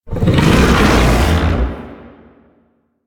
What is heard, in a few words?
Tank Infested Vocal Moan; Enemies; Barks; Warframe; Lasrian